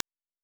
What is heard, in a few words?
step; Pico